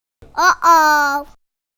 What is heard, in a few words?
Surprised Child Voice Sound